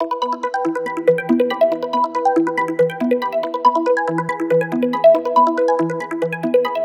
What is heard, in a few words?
Arp E 140, Melodic Loops, Color Bass; Hydrodip; Ghosthack - Color Bass Essentials